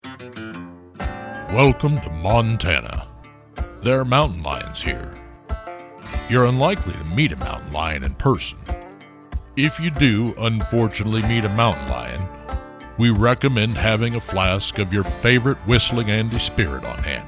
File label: Whistling; Lions